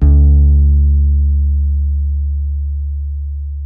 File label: H. FLS D#3, Bass; Spectrasonics; Bass Legends